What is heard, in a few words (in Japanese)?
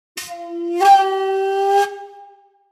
尺八